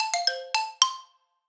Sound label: Marimba, notifications; Honor Power